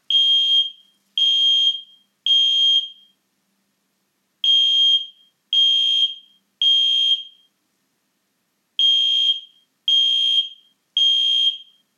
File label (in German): kohlenmonoxidmelder alarm